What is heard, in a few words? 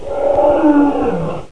monster Sound; Oooooooh!; Ferazel's Wand Demo; Transcription: Oooooooh!